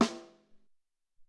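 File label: Hi Rim Snare, Alesis SR-18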